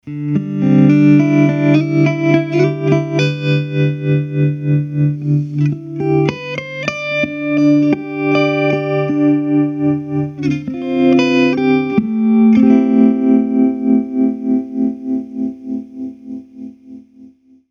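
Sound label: Volume LFO Comp Auto Fade; Voluum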